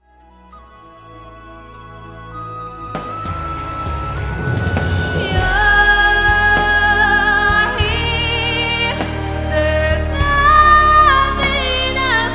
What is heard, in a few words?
Vocal